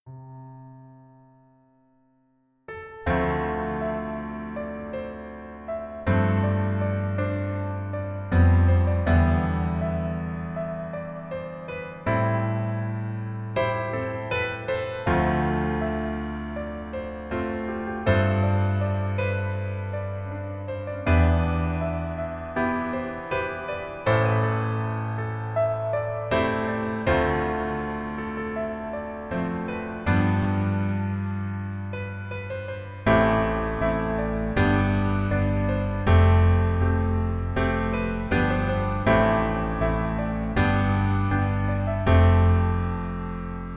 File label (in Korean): Piano ver 멜로디MR